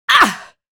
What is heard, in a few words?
pain, female